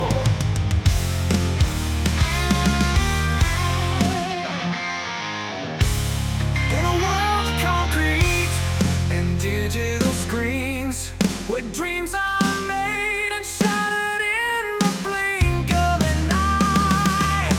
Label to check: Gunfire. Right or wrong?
Wrong